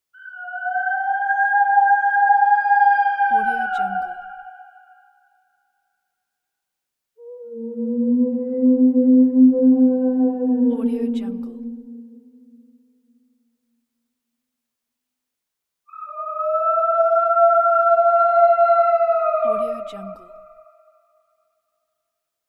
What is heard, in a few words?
ghosts voices